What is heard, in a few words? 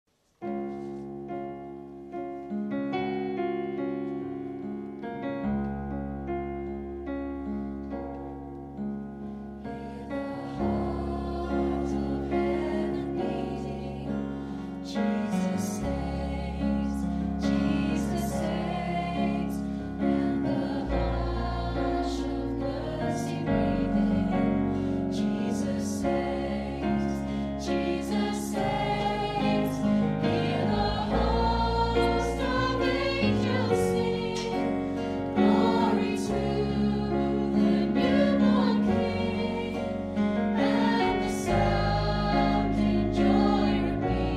Worship Band with Vocal Group; RBC